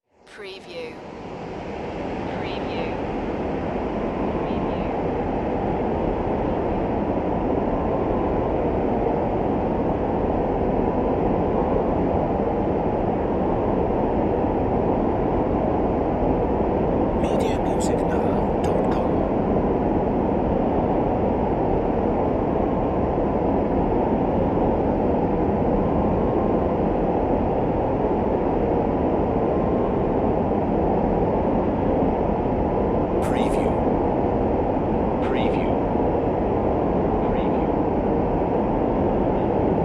Drone Vortex Steady; Sound Effects Bible

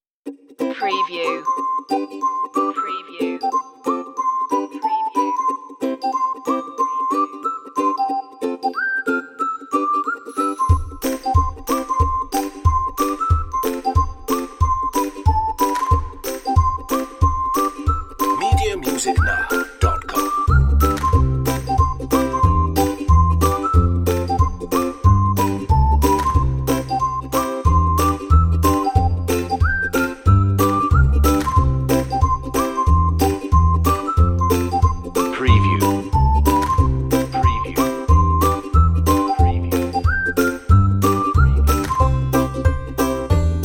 reggae happy whistling

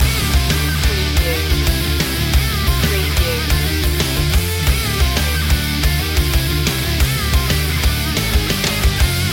metal master loop

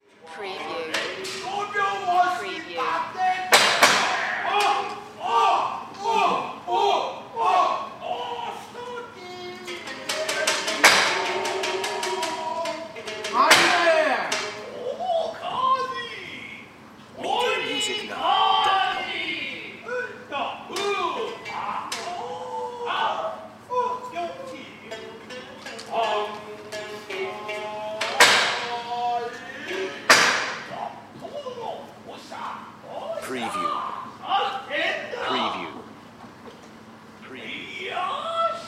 Kabuki theater male performance whips Japan

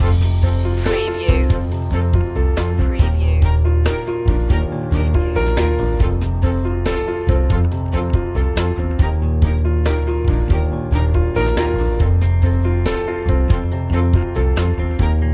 acoustic mood loop

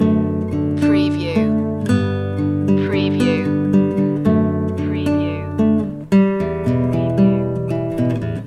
travel and hope loop

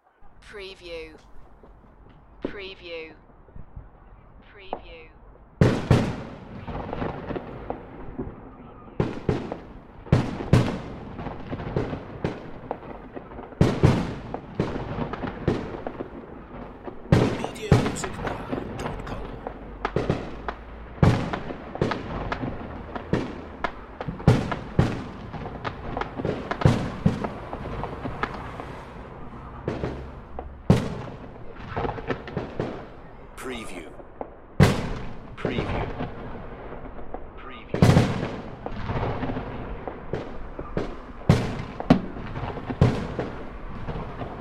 fireworks; beanstalk audio

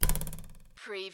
CARTOON RULER TWANG

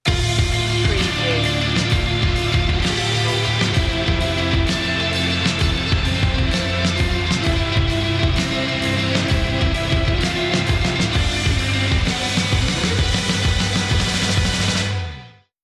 slow

